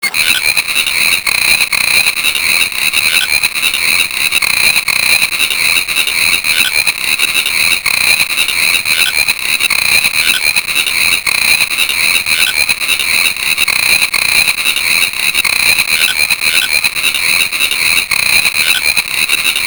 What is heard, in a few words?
Chord B